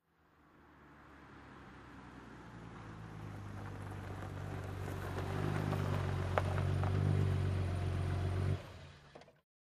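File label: Bmw 530i, In Slow, Stop close up, Off.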